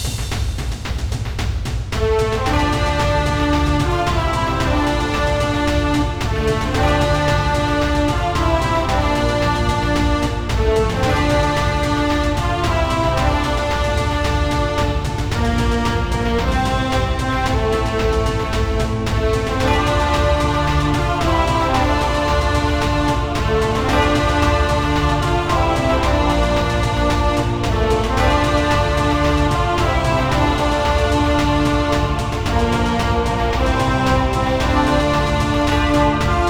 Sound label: Tower Defense, epic battle